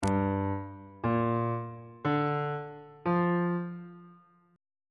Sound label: e sharp dorian 7th chord 2 is f double sharp minor 7th on bass clef